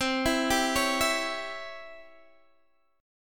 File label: C sharp Minor Major 7th Flat 5th C, guitar chord